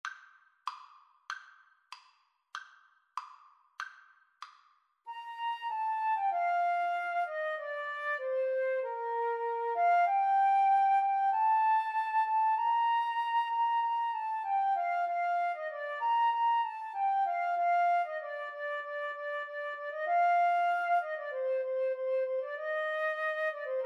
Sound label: Flute Clarinet Duet